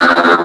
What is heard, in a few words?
drown; Alien Arena; lezard